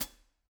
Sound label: room Pd Hat, drum